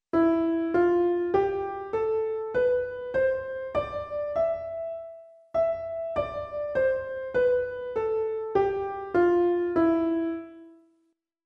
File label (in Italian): esercizio scala Mi errata